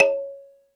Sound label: Jungel Percussion, E-MU Pro-Cussion; drum machine